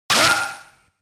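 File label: attack; Fight Knight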